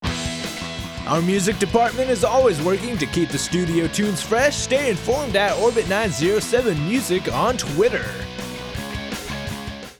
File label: Music Twitter Sweep